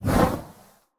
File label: Burning Hands Whoosh; Evolve; Meteor Goliath